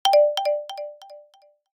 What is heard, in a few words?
Alert notification delay